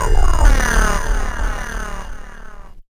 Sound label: Mad Beast; Jungle Warfare 1&2